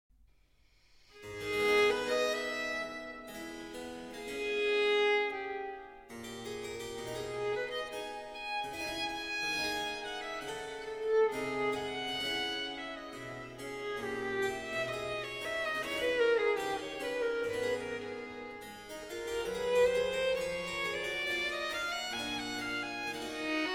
Trio Sonata in C Major, Largo, for 2 violins and basso continuo; BWV 529